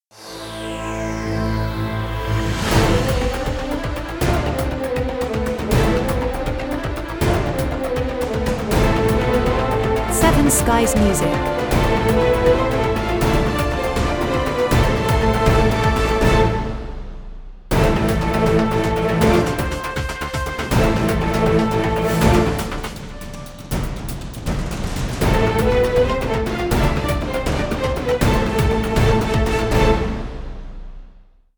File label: Striking A Punch